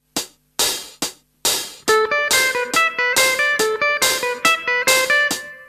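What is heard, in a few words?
natural minor scale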